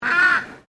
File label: Birds Ibis Australian White Mature Honk; Birds from South Australia; Animals